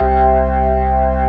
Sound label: Organ B3 Hammond, C2; Best Service ProSamples vol.55; Retro Sampler